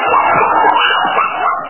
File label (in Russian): shum razdichavshihsya i krichashik obezyan